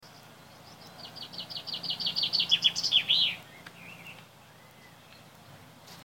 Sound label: chaffinch